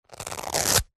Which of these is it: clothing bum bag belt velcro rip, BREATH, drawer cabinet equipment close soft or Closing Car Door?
clothing bum bag belt velcro rip